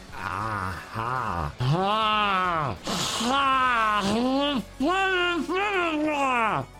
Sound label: haaaaaaa